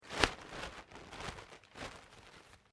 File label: Plastic Sheet Smoothed Folded; Material components for constructing more complex sounds